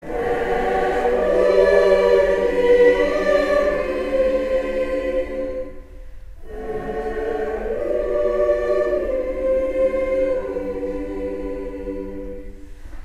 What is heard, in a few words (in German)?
Langenwanger Jodler